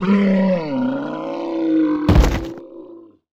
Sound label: Host Die